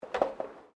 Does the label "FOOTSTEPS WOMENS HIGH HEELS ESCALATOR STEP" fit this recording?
Yes